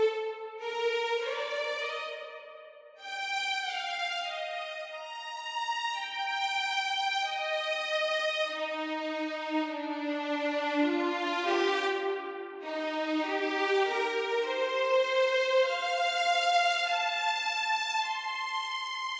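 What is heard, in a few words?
violin; Toki Tori Collection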